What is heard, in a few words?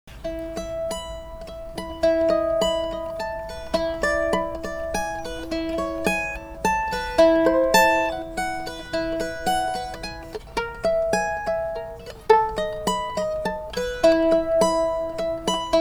mando minuet mandolin tunes